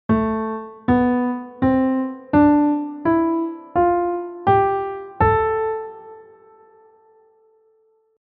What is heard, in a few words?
A Major bad